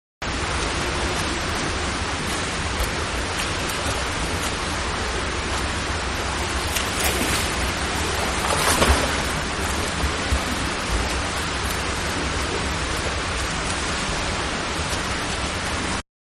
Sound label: Swimming Diving Swimming ambience, Swimming Indoors-Ambience; Miscellaneous Sports (Sound Effects:Sports); Manhattan Production Music